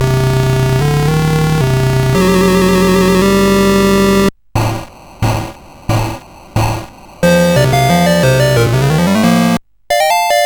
Musicbox, sid CSG8580R5

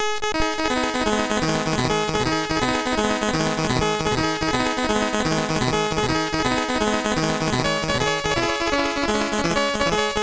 Acid, MOS6581R2

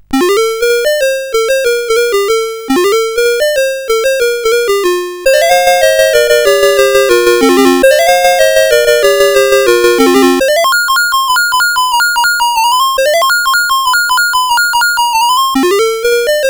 Quari, CSG8580R5

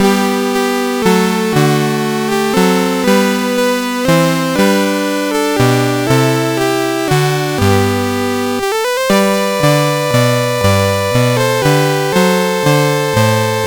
America, CSG8580R5; sid

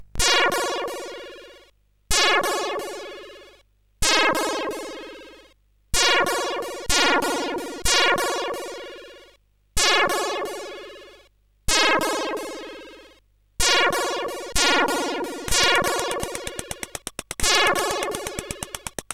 Noise, sid CSG8580R5

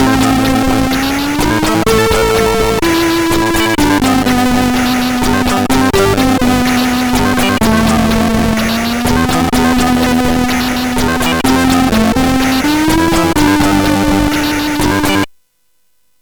Just So Cool sid CSG8580R5; hvsc

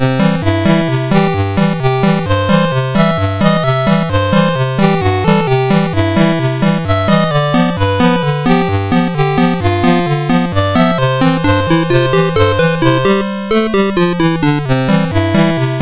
sid MOS6581R4, GAMES; Forest of Doom

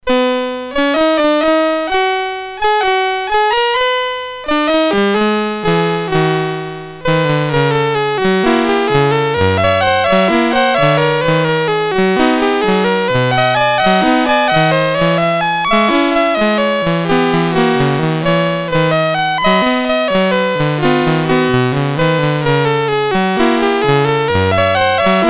Ragtime, MOS6581; MOS6581R4

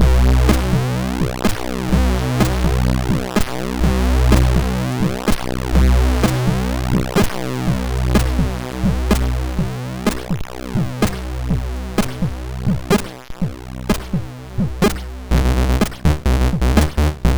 quick-step, sid MOS6581R4; Aztec

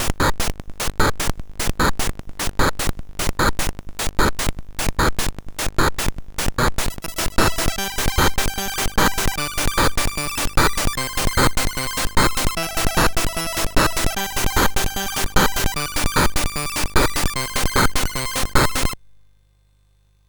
Tension Remix sid MOS6581R4; Nitro Pulse

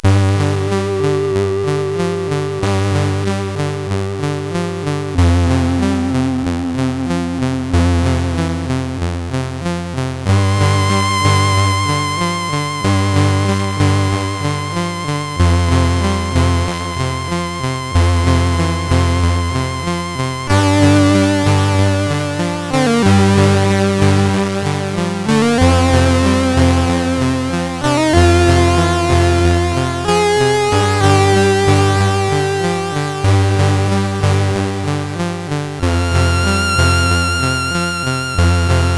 Loopz, CSG8580R5

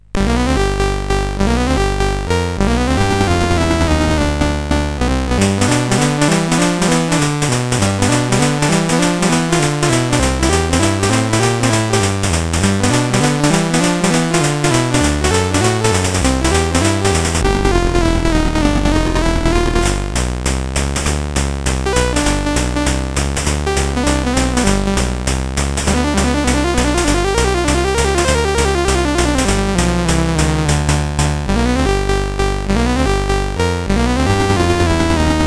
CSG8580R5, GAMES; Street Rod

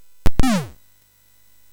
Shuffle, MOS6581R3

